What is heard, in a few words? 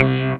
High Dist Bass Lick